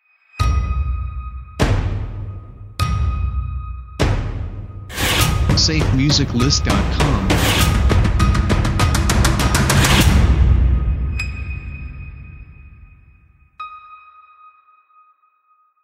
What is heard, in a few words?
Action Trailer Logo; SafeMusicList